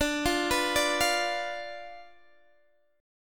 D Minor 6th Guitar Open D

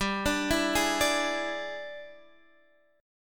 E 7th G, guitar; E7/G; arpeggiated

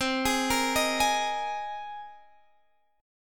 C Minor 7th Sharp 5th, Guitar, DADGAD; down; chord shape

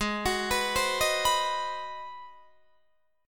G sharp 7th Sharp 9th, Guitar, Open D

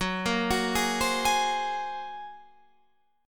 Bb 7th Suspended 2nd Sharp 5th Gb

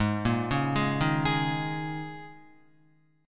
Ab Suspended 2nd Suspended 4th Ab down Guitar

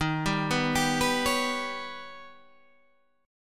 D sharp Augmented 7th D sharp, guitar

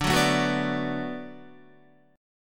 D 6th D down Guitar, chord; D6 strummed; JGuitar

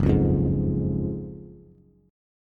Gb Major 7th Suspended 2nd, Bass; GbM7sus2 strummed; JGuitar

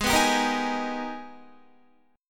Ab Minor Major 9th, down, Guitar; AbmM9; strummed

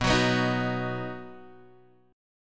B Major B, Guitar, Half Step Down

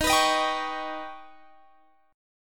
Eb Augmented 7th, Guitar Standard; strummed; x,18,21,20,20,21

